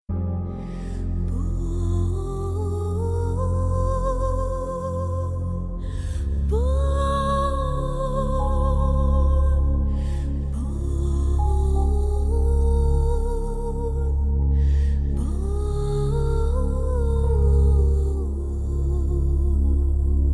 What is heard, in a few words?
background theme; bol